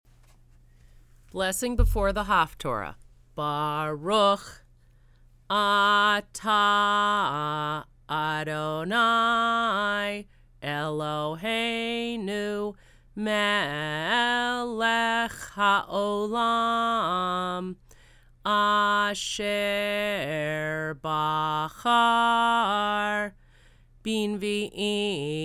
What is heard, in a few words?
Slow Blessing Before Haftarah